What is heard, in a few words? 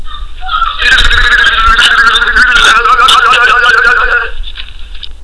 war cry